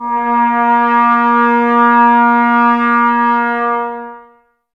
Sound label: brass, Tbs Mute